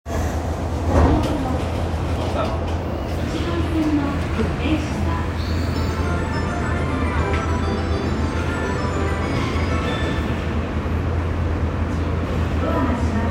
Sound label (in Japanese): 日比谷線神谷町南, train sounds